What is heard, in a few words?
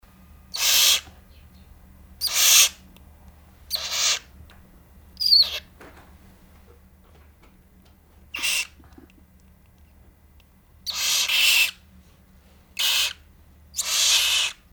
baby barn owl